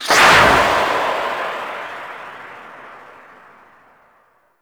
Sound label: Archwing Healer Engine Boost Slow Short, Vehicles; Warframe